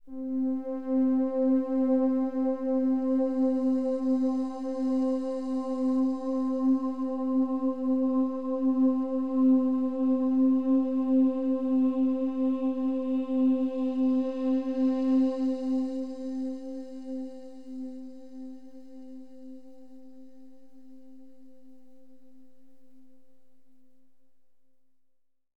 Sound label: middle C